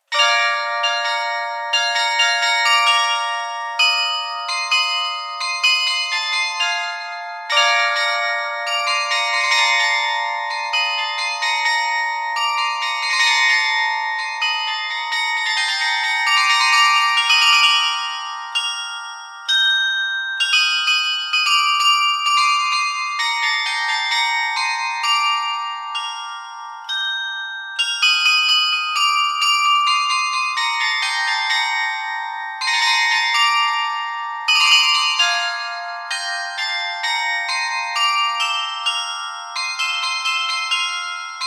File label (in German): Glocken Glockenspiel; 18 Glocken; Grassmayr